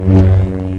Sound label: saber; claus